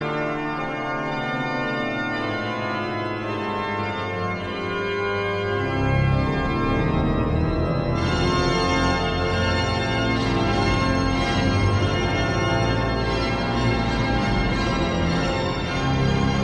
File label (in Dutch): Instrumentaal orgel